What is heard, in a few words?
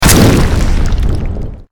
damage; gwyn